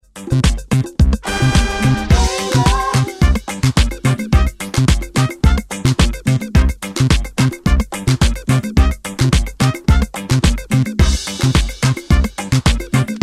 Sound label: Dub